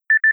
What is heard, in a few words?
key; Samsung; Z4